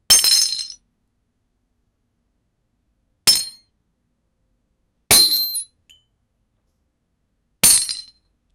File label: metal drop; object